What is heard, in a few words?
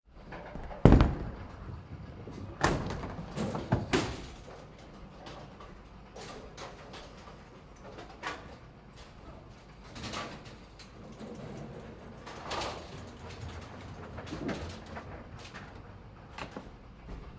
bowling gutter ball return